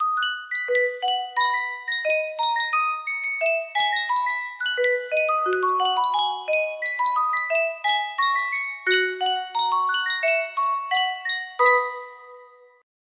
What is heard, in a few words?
sankyo music box